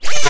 spinz

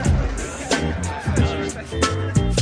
one bar funk, 092; MELODIC LOOPS; SAMPLED MUSIC LOOPS

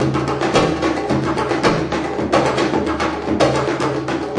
japan rockstar, 110 bpm, drum loop; 101 to 110 bpm

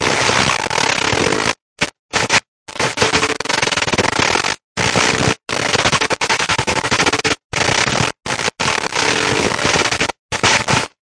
Noise Found Percussion; 074 to 128 bpm

